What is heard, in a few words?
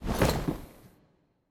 armor; Smite; god Khepri